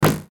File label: futuristic button